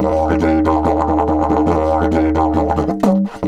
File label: 140, DIDGE RIFFS